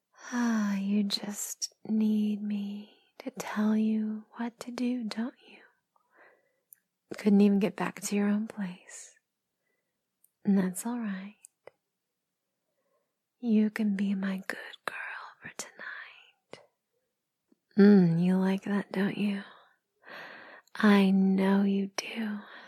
Blooper [cat noises] [laughter]

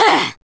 Grunt; Lewd SFX

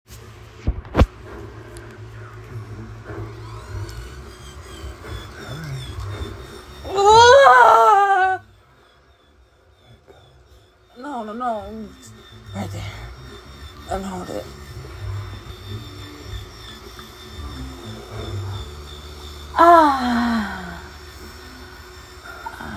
moaning, screaming, begging; [NB4M] Cute Little Audio; Cute Moment of Sincerity

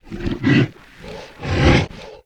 Bullsquid idle; BUGBEAR

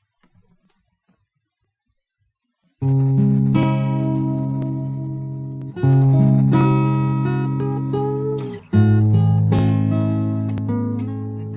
(Acoustic Cover); Pony Music Archive

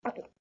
Swallow; Lewd SFX

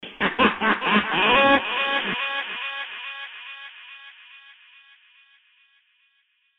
Hahaha Delay; 142 BPM

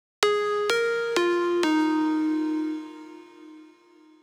Ding Dong; Pony Fan Music Remix Stems

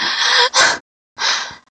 Pant

